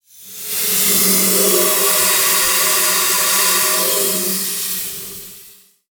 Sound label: Industry Tool Air Duster Spray Hollow Build Up